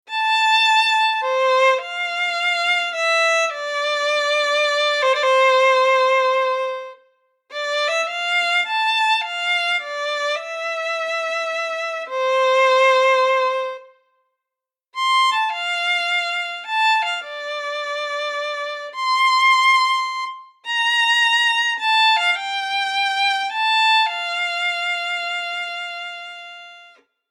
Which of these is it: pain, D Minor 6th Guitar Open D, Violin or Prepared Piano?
Violin